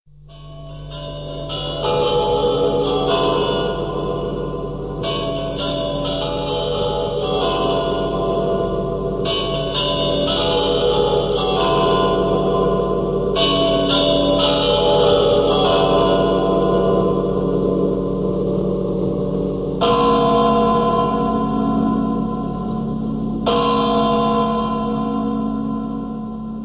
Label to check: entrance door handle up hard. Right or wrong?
Wrong